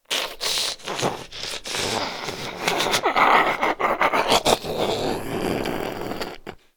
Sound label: idle, zombie; DayZ-Epoch; dayz sfx